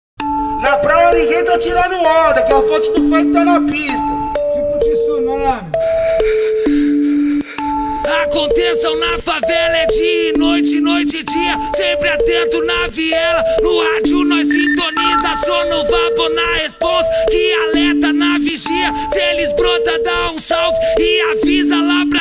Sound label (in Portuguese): explode funk